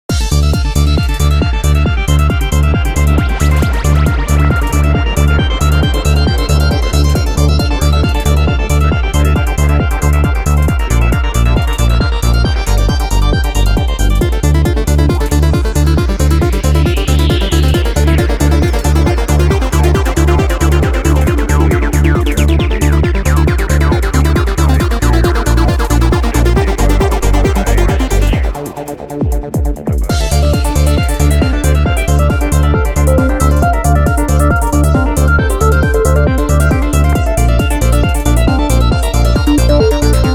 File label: Pinball, Music; Pure Pinball